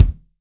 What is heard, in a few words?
KICK, FUSION KICK